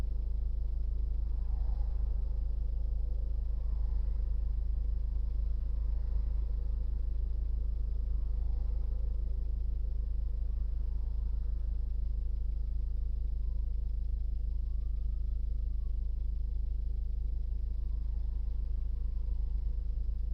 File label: street; sony wh-1000xm5 wireless